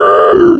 death wildkin; dota; creep deaths

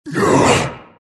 grunt; dota; abaddon

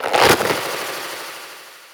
bramble layer; weapons; dota; dark willow

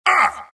wraith pain; dota; skeleton king

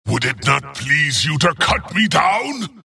death long; dota; skeleton king

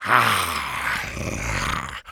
zombie spawn; undying

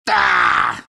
riki pain

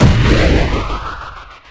greater bash; spirit breaker

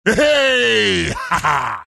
laugh; bristleback

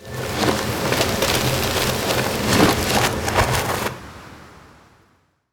portal; dota; furion

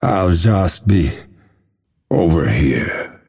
death; dota; phantom assassin

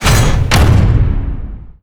pick captains vs

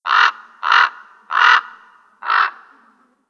raven; ambient soundscapes; dota

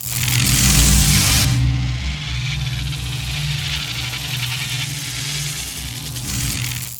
kinetic field; dota; disruptor

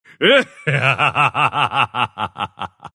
pangolin laugh; dota

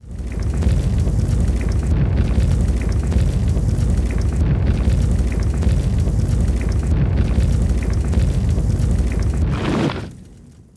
black dragon fire; weapons; dota; creep; neutral

